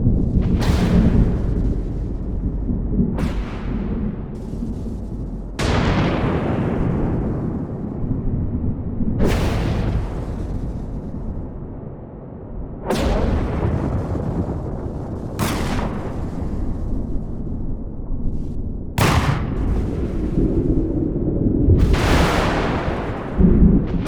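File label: ambient electric storm; Stellaris; cosmic storms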